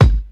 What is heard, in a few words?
high quality clear bass drum sound g sharp key, bass drum single shot